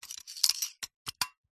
office stapler mechnanism metal movement clicks short